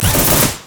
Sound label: rumble carpet bomb